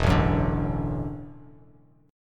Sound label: E 7th Suspended 2nd Suspended 4th E down Bass Standard; strummed; chord; JGuitar